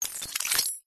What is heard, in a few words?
nanotech complex short reversed robotic robot cyber tech nano micro reversed; PMSFX